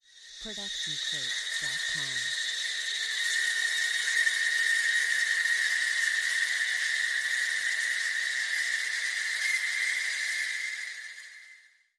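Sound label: sci fi ambiance; soundscrate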